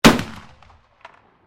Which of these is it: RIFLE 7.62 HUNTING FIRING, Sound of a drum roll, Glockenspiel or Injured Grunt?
RIFLE 7.62 HUNTING FIRING